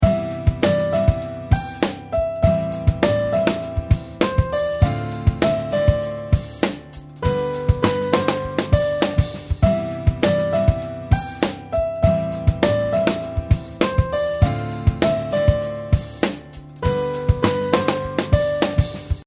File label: Gt melody step6